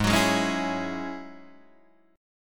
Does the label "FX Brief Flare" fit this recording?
No